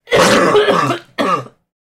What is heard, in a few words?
female cough; Barotrauma; Real Sonar